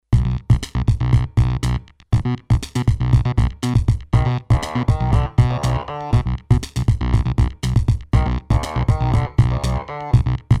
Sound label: Creature; M-Audio Venom; Multi